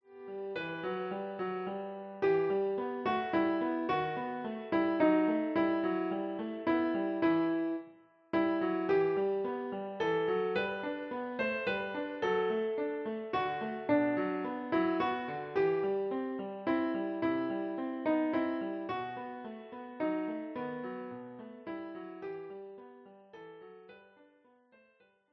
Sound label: What child is this Kl